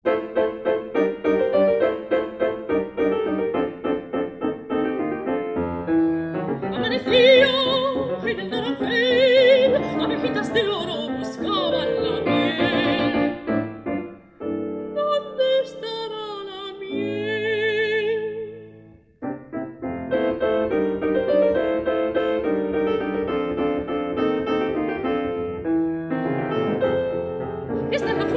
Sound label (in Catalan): Veu Piano, Canciones niños; Cancioncilla sevillana